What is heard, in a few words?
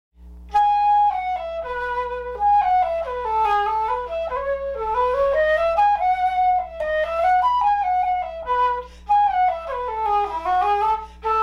SOLO flute